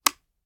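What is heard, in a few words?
selector on, weapons foley; gmod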